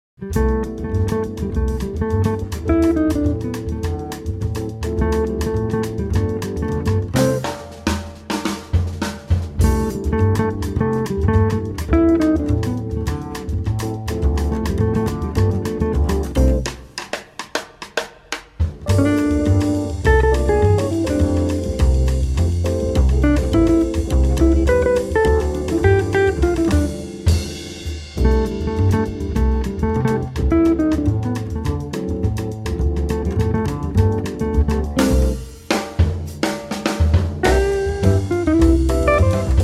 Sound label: Samba